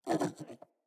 Puppy Idle Snort; Kubrow

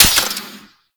Spark Rifle Mech; Warframe; Grineer

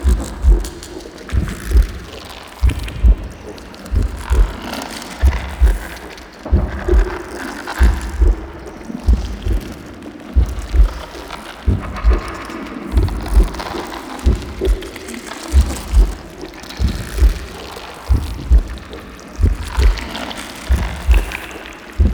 Infested Eris Interior Brain Loop

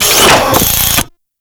Drone Face Shield Close; Warframe

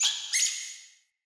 Player Floppet Vocal Double Squeak, Animals

